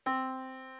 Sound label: Yamaha Disk, piano sound